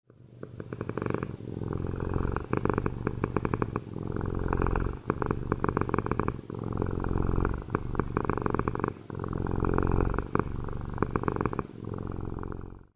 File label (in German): Katzenschnurren; Geraeusche